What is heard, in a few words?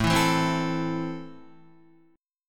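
A Minor, Guitar, chord strum; Am strummed; JGuitar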